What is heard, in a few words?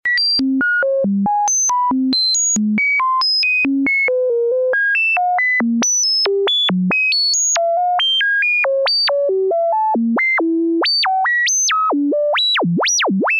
random with slew, quad lfo